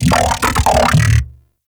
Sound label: Mech Ambient Vocal; Nechro Mech; Vehicles